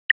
Cam Stop; Samsung Galaxy Trend GT-S7560M

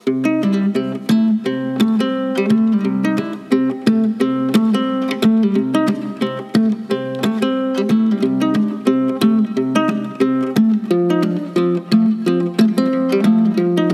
ringtone country guitar; Mudita Pure

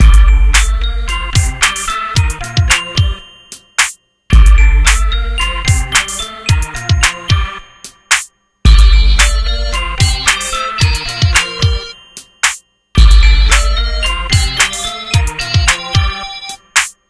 music old skool, ringtones; Samsung GT-i7680; cmcc

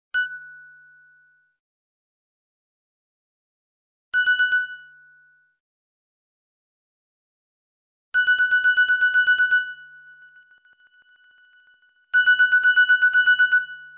Clock

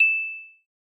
Twinkle; LG K92 5G

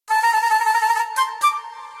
music flute; Samsung GT-i7680; cmcc

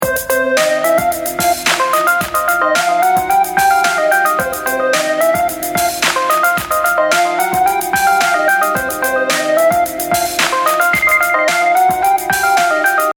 Default Tone; LG VS750 Fathum

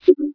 mahjong incorrect combination; game; Nokia N900

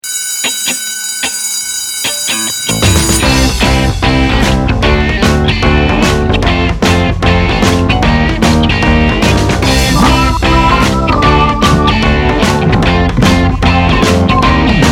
School Bell Rock; Sony Ericsson; ringtones

